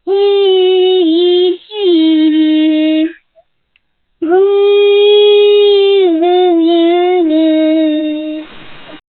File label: fight; mar khane ki voice